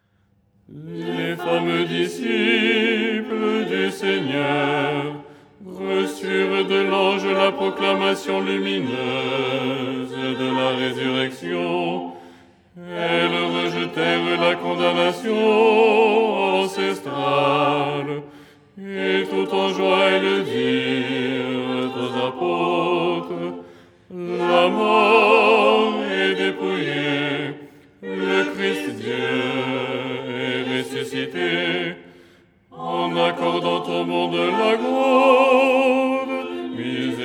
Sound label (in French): basse; Tropaire du dimanche; Les 8 tons en français; ton 4